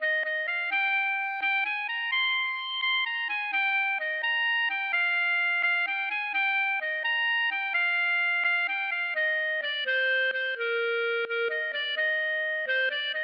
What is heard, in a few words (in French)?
valse